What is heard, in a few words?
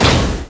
flamer start; gusanos promode